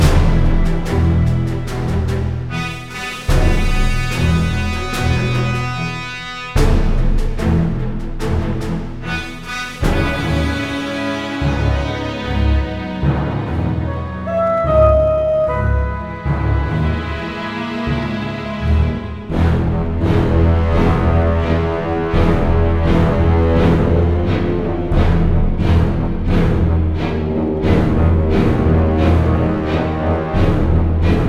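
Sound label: Low Combat loop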